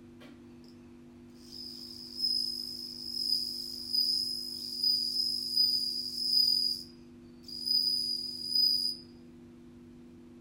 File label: Cricket